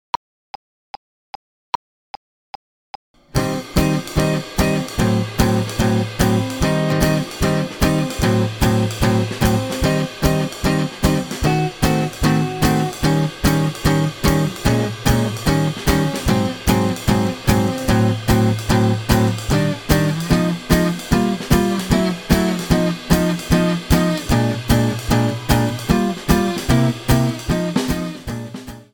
drill in eb

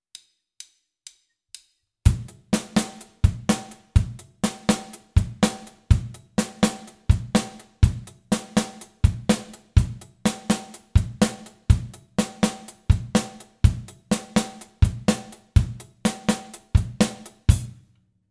surfbeat

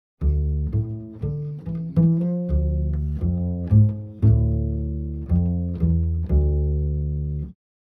doppelgriffe double stops

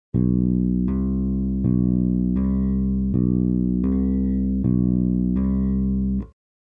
hammer on

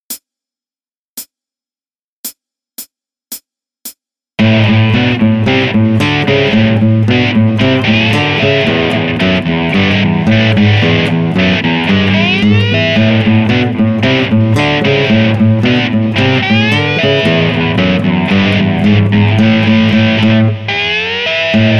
blues rock

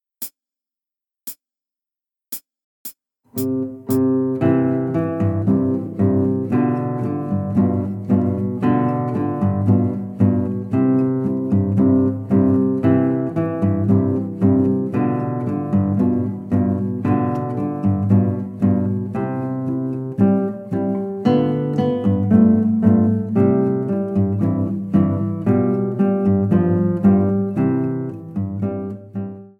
mozambique trad brasilien

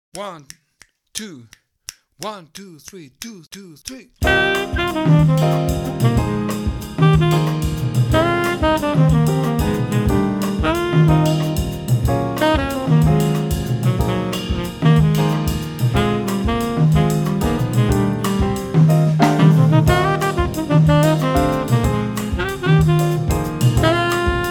6 8 afro play along

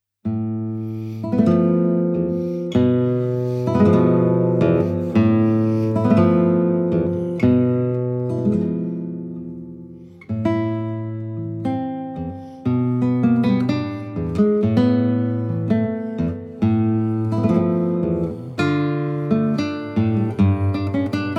tango

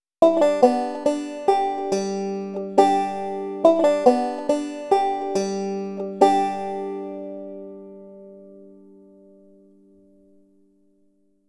pull off index lead style

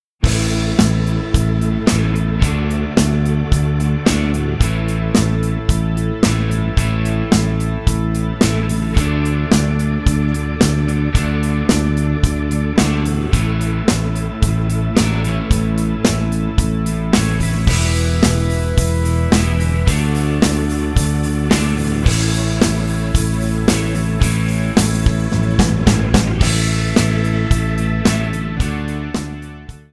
open string rock

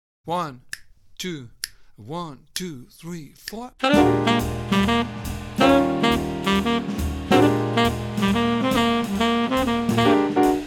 tenor in 2 play along; madness in 4

